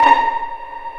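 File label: Vio pizzicato A#4; Digital Sound Factory Virtuoso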